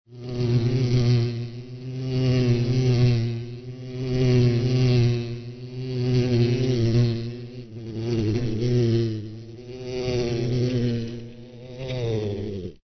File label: CARTOON BEE